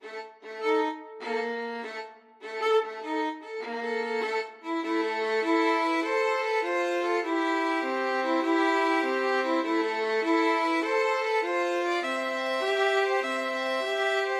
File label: when johnny, violin duet